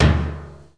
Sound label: boom